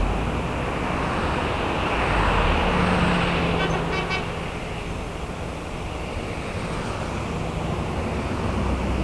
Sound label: traffic; MOBICLIC 13